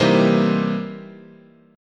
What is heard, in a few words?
C 7th Sharp 9th, chord